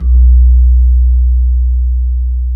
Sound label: KEY GONG, Bronze Gamelan; E-MU Formula 4000 Series Vol. 4; Earth Tones